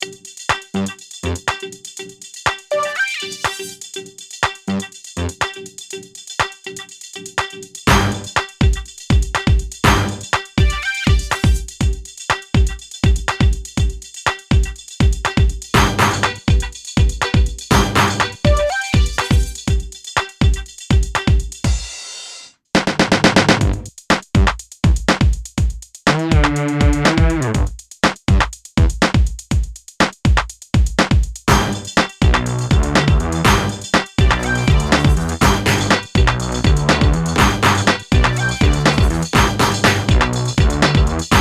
Instrumental; Network Production Music; NM306 The Playroom

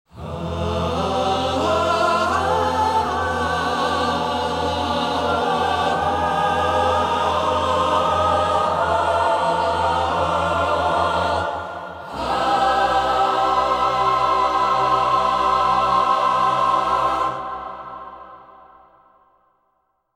Fanfare; Network Production Music 082 Vocal Stylings (Specialty)

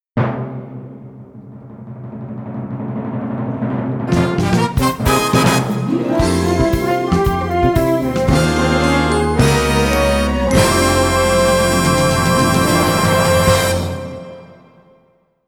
Fanfare; Network Production Music; NM111 You're The Very Best! (Multimedia)

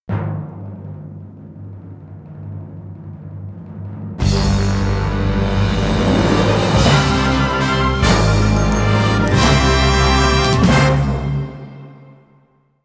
Fanfare; Network Production Music; NM111 You're The Very Best! (Multimedia)

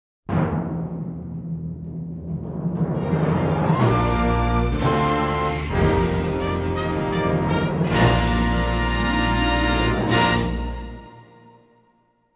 Fanfare; Network Production Music; NM092 Above & Beyond (Multimedia)

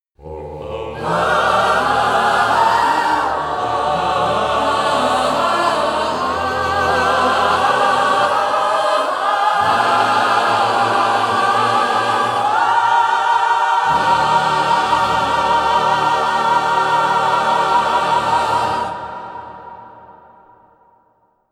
Melodrama Fanfare, Vocal Stylings; Network Production Music

